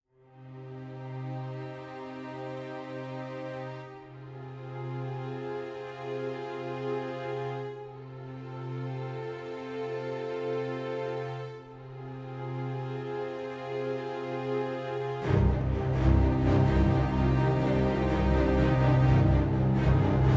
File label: Orchestra; ProScores